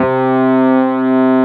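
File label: Wurlitzer C2 - R; USB Soundscan vol.09; AKAI; Keyboards Old School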